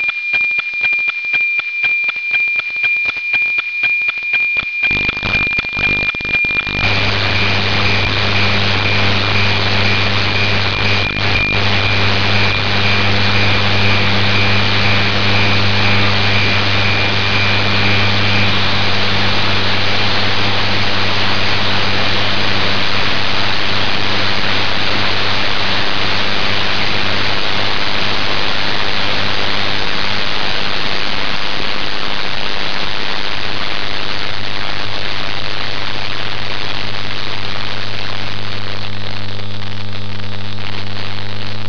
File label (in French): QRM NOAA18 par Météor M N2